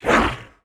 Devil Scythe hit; Karda Mahal Underground Temple

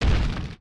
Burning Lava Golem move

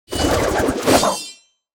Sword Inertia Attack; MUDevs S20.1-3

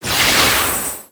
Magic Arrow Cast; MUDevs S20.1-3

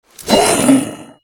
ShadowKnight Attack

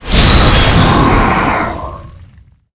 King Summon General

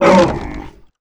Cart puller hit; Kubera Mine

